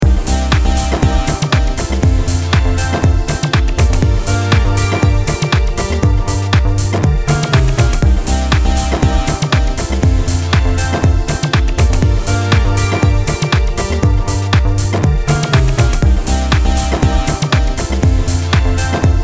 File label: jet ambient house